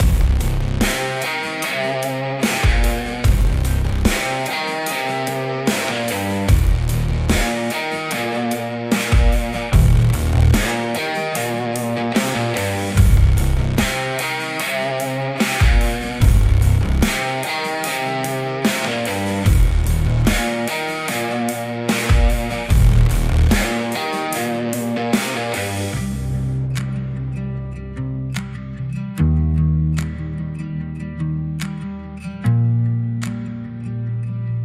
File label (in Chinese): Mix Bus, 多频段磁带模拟效果插件; Korneff Audio - Chocolate Milk v1.0.1; 多频段磁带模拟插件; Burn It Down; Hype Train